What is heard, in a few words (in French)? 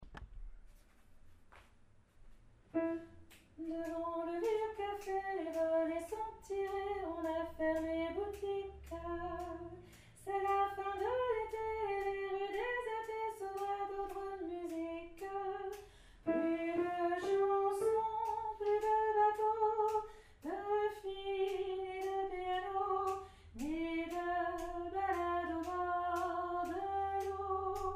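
Soprano, Choeur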